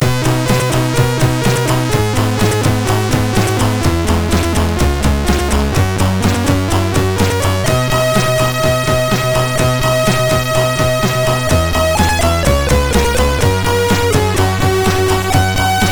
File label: Air on G String, MOS6581R3; hvsc